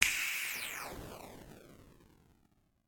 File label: Click Fingers Reback; Game rip; MiSide